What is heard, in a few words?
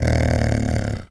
moan; The Elder Scrolls III - Morrowind